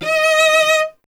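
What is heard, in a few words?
strings cello D#; Miroslav Vitous; Solo Instruments VOL-1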